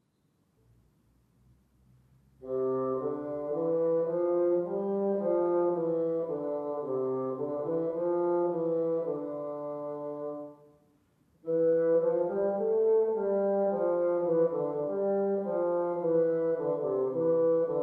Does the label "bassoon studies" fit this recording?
Yes